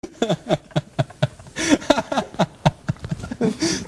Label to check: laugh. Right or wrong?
Right